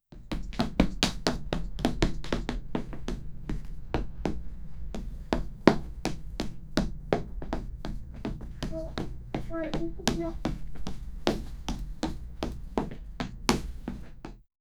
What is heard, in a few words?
foot steps for a child, joy